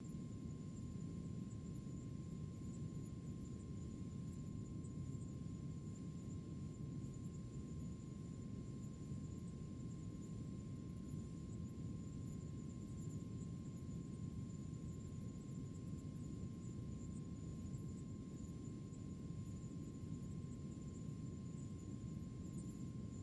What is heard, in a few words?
room tone; My Sims